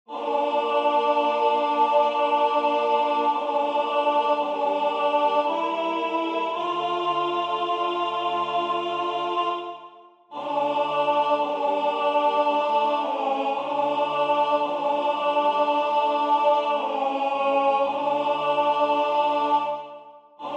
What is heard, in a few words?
Iste est panis alto; Gauthier